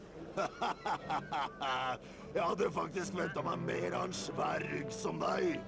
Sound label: haha